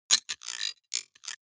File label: digital metal clicking, robot; comedy cartoon